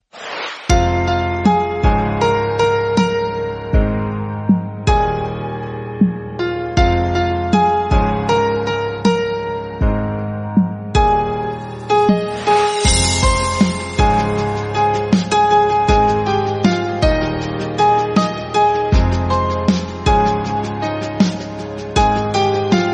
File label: INSTRUMENTAL RINGTONE